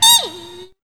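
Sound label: TRUMPT, trumpet; E-Lab; X Static Goldmine 1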